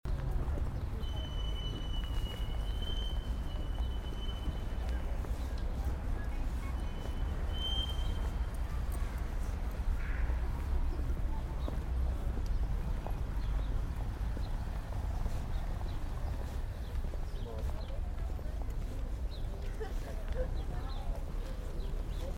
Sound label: ambience